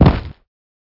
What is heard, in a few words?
Monster; Zenless Zone Zero